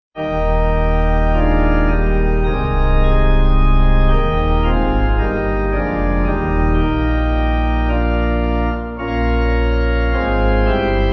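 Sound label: Pipe, Pipe Organ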